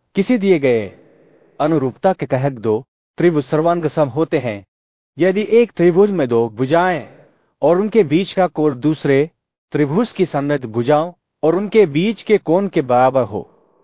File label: techno